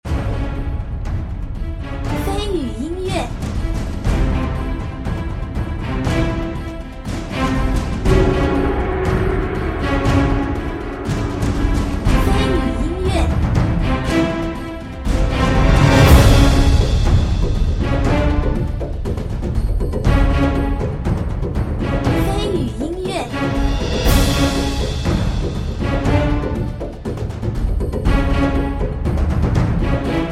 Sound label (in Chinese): 战场-庞大-震撼